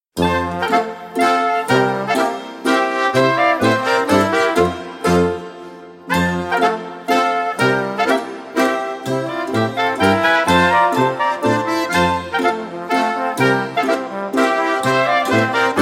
niederbayrische mazurka

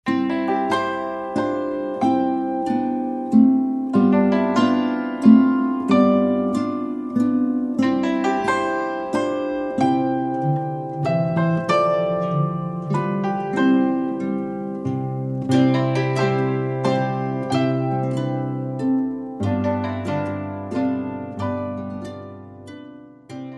salzburger menuett

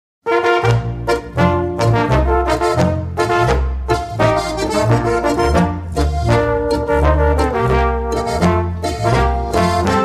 boarischer

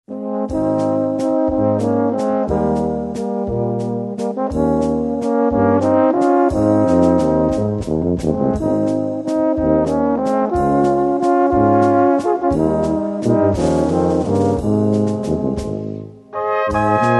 walzer

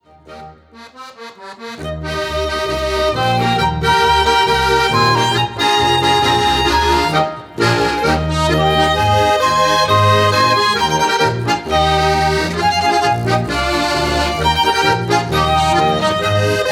polka

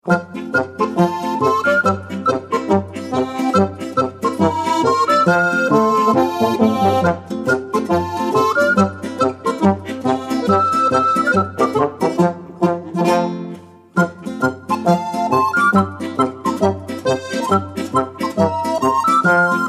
polka

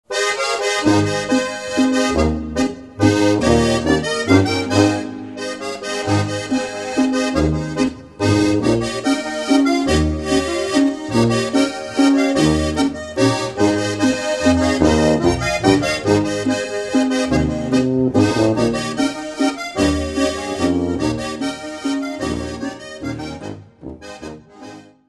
landler

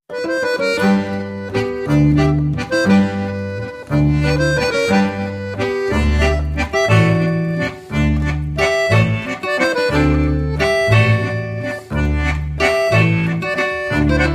landler

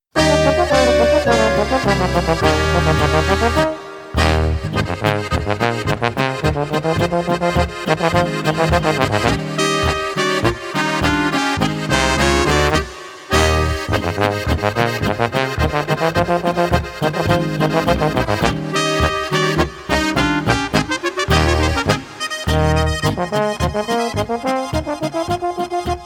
bariton schmankerl

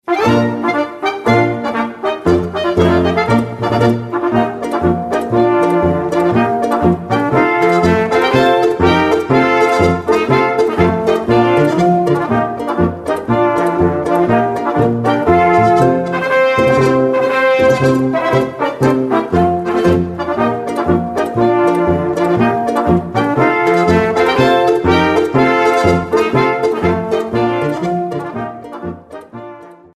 marsch

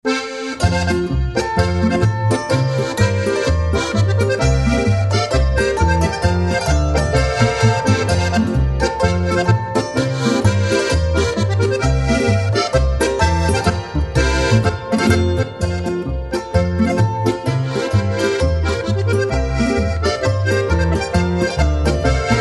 polka; aufhamerpolka

